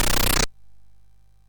MOS6581R3, GAMES; Spikes Peak

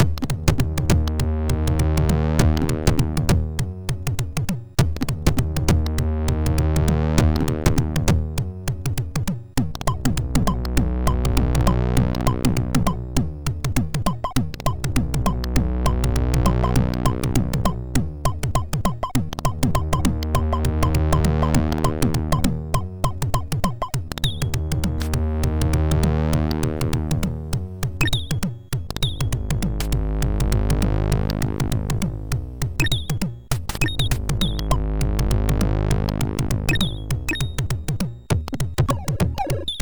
Jungle Drums, MOS6581R3; Mermaid

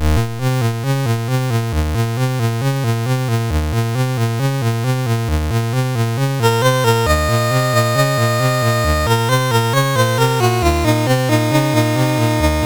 Gatlinburg Boogy, sid MOS6581R2; Master Composer

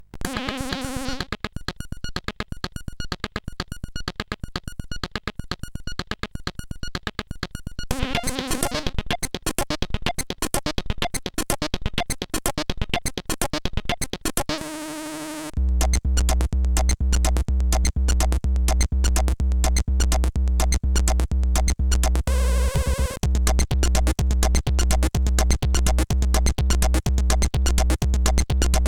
Amiga Style; sid CSG8580R5

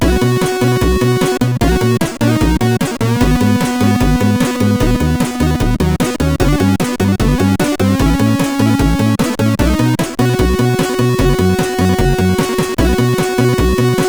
Ballad sid CSG8580R5

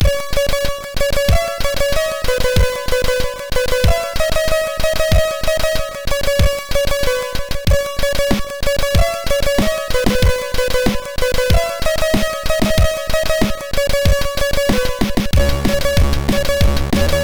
Piano Fun, sid MOS6581R3

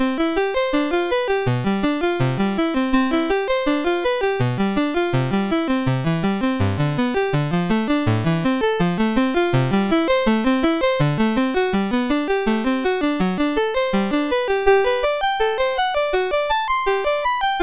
Piano Study MOS6581R4; Piano Study No1 from Opus47

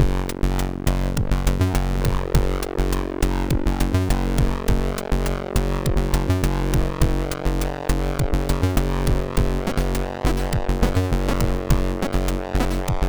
sid MOS6581R3; Bus Noise intro

